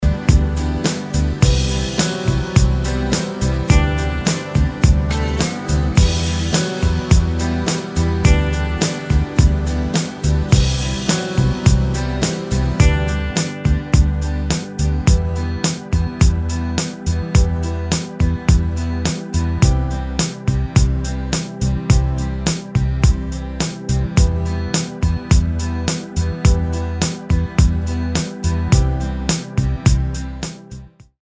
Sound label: Karaoke Song